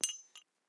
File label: perc; eddyflux